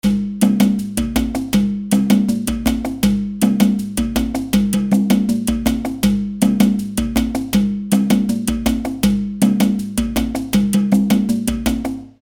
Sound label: Bomba, trommeln